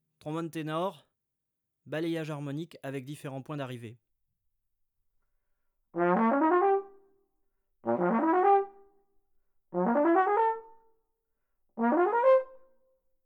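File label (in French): tenor exemple de balayages avec différents points d'arrivées, trombone; Effets; Effets harmoniques; traite orchestration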